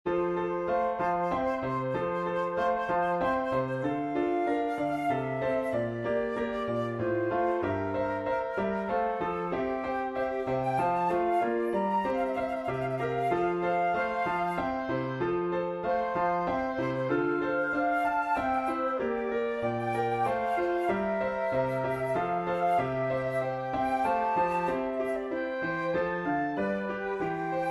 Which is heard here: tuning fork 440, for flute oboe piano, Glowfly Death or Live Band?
for flute oboe piano